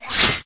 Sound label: blaze spin; hellblazer